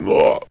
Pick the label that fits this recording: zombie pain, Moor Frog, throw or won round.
zombie pain